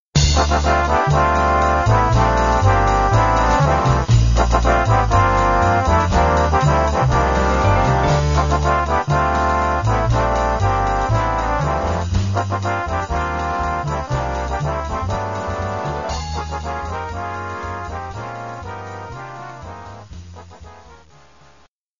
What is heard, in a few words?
trombones